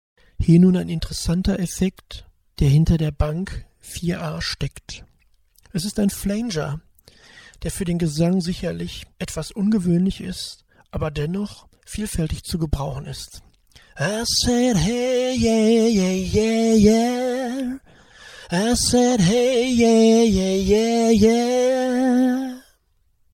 Preset Flanger

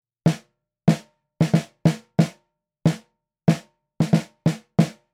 Snare Beyer201 preamp only

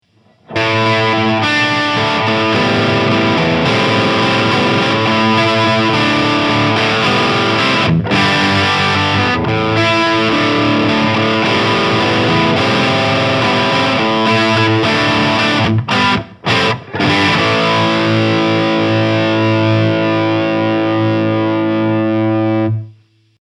Godin Summit Classic SG Heavy Crunch

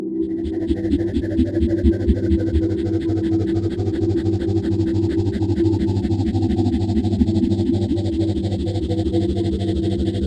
Noises Phaserland; AudioThing Hainbach Bundle

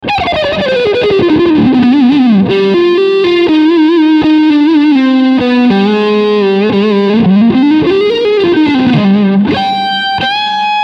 Leadsound Front SC

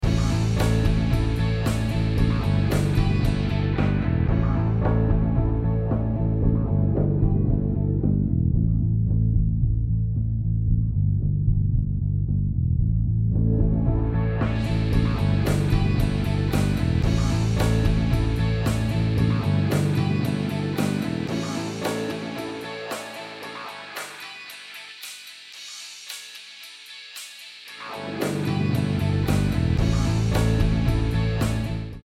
Song Mono EQ High Low Pass; GreatRiver; Harrison32

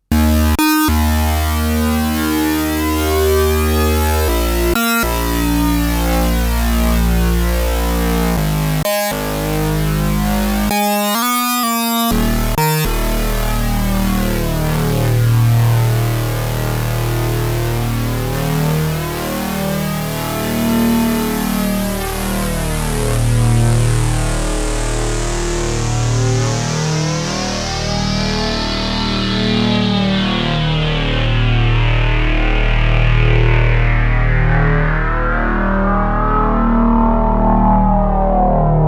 Sync Sound; OBSX

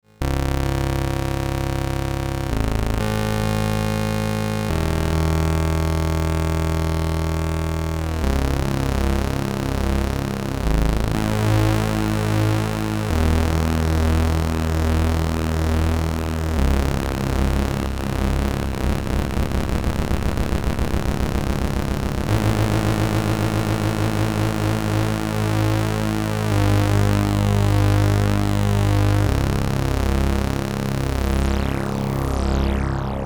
PWM Bass

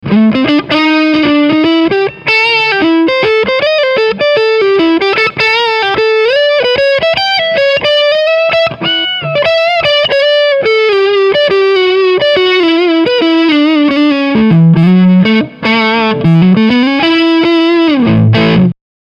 Lick Front SC

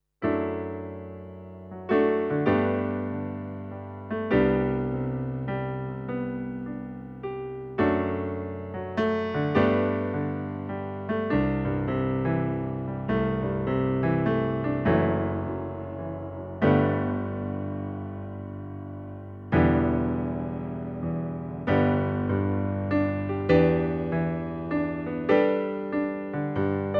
Classic Piano

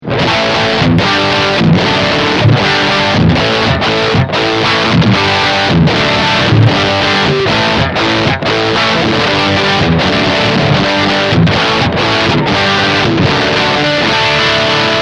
Overdrive + Boost

